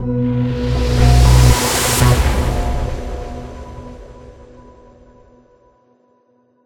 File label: Transition End